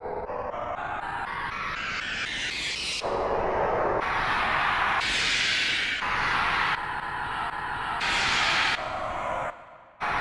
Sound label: charge; Populous Demo